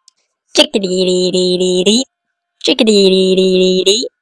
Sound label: chickadee